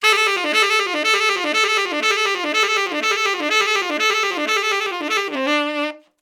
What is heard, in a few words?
90bpm Repeater 3 Bar